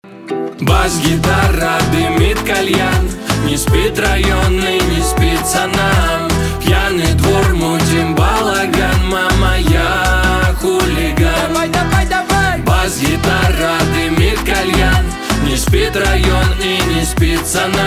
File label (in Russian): бас гитара; русский рэп, битовые; аккордеон